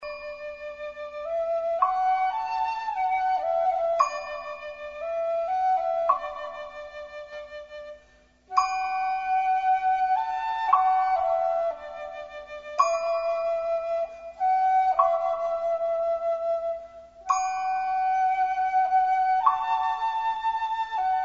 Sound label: flute